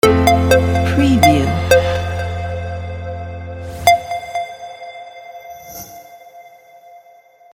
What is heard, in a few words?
logo motion